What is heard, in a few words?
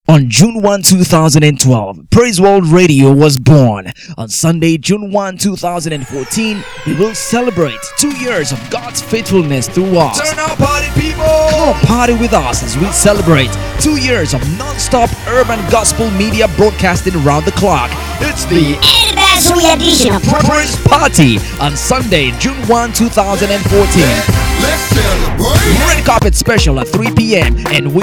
Radio Anniversary Praise Party Jingle; Praiseworld